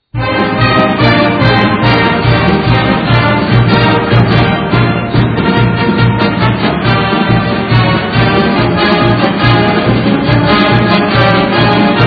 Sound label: Notre Dame Victory March